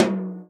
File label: tom, Real Drum Kit, jungle kit